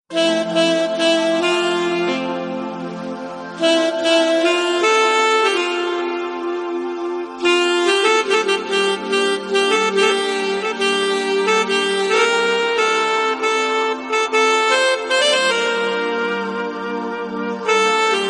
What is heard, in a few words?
INSTRUMENTAL